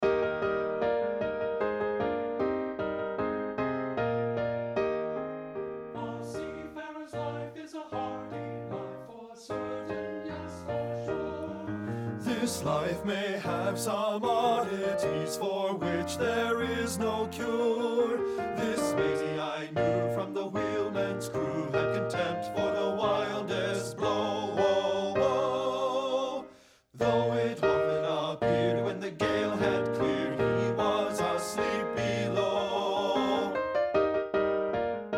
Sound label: A SEAFARERS LIFE BASS, men; partbypart